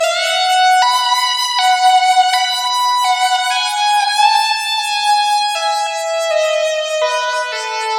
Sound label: xtra lead, Leads; Sonic Foundry (Sony Creative Software); Ugly Remnants VOL-2